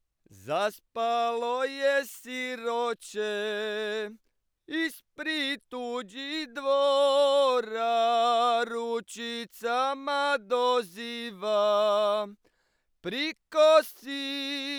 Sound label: klapa, Croatian Folk Singing